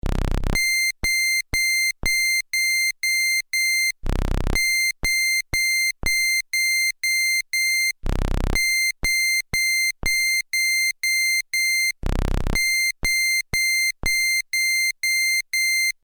DCO glitch; DSI Evolver

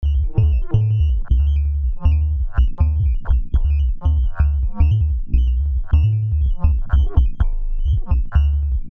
Buchla 200 Dub Bass

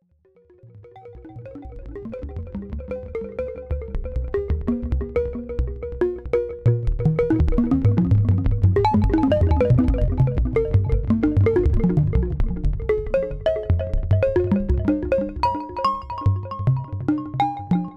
fm marimbas; Cyndustries

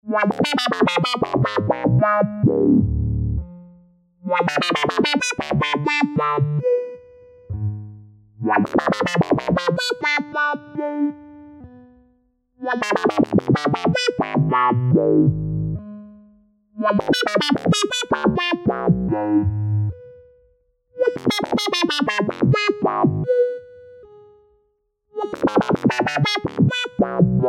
filter

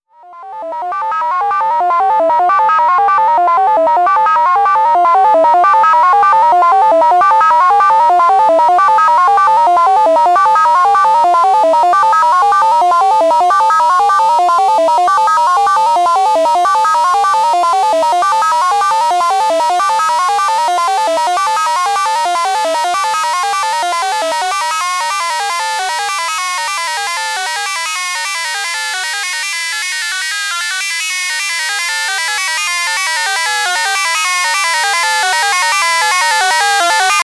Model 15 VCO; PLAN B analog blog

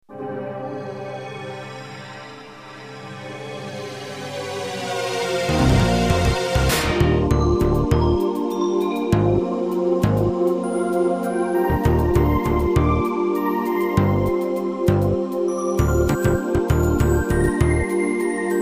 Cats Meow; Ensoniq VFX SD

